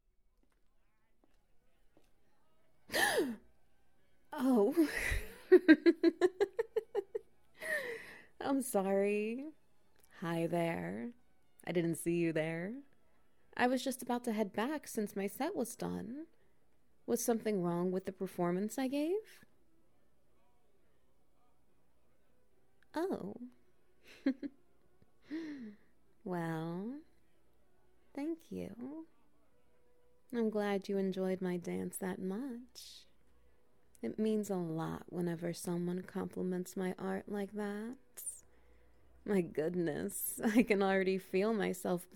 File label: Ambience Wet Noises; The Scylla's Dance